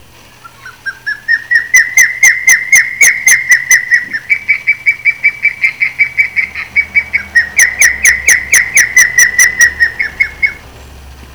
Osprey

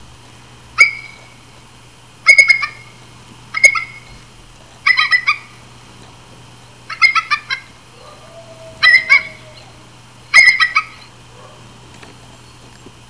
Bald Eagle

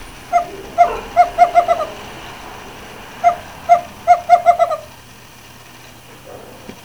Screech Owl

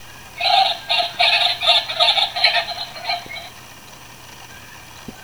Ring-Necked Pheasant

